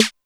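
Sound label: Snare Flanger, Alesis D4 drum machine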